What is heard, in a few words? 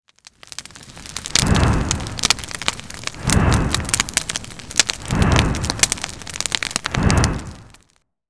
fire; ancient